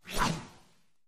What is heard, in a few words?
crossbow fires; Skillzgaming; battle Mania Desktop